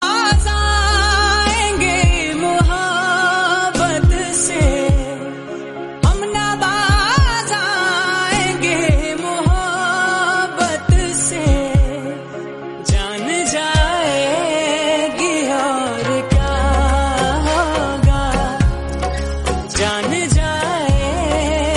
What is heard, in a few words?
hindi song